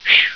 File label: Blow; PC; MARIOSFUNDAMENTALS